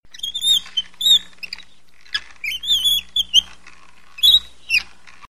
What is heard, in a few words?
Squeek